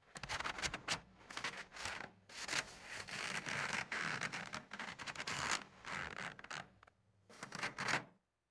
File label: slow creaky paper thumb friction